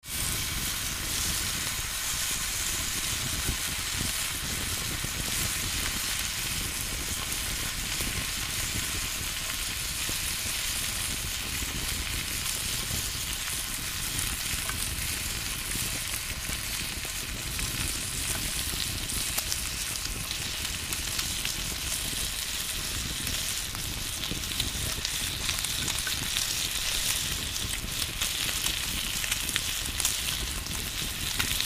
Meat Sizzle; cooking

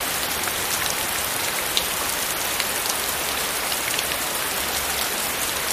Rain Hard On Cement

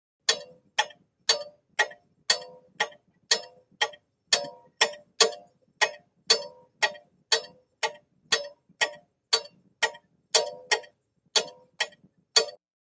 Grandfather Clock Tick

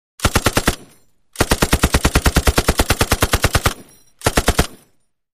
M60 Automatic Multip

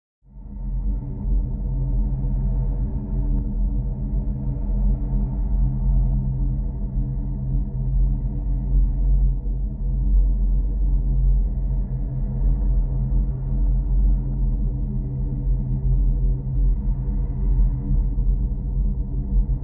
Abandoned Space Ship

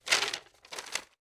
Parchment Paper Rust; paper mvmt

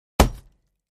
Axe Paneling 2 Side Du, axe hits

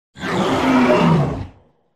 Animal Stinger; stingers & whooshes

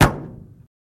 B17 Bomber Rear Hatch, b 17 flying fortress

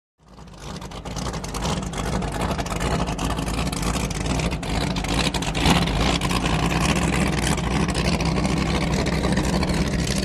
Power Boats Amb Bird, off shore power boat

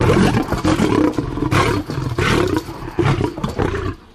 Lion Panting Cage; big cats

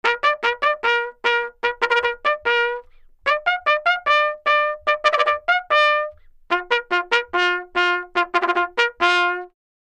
Bugle Plays Mess Call, bugle civil war

